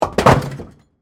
Bowling Pin, Knock, Over, Single, Close, Perspective; Sports; Bowling Sound Effects; BlastWave